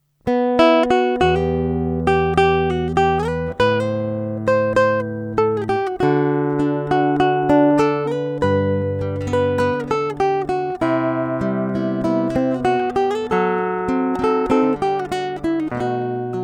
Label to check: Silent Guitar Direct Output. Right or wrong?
Right